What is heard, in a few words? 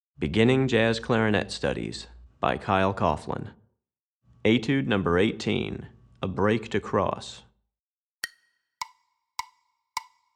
Piano; Recordings For Beginning Jazz Clarinet Studies, Volume 2; Piano Accompaniment for Etude #18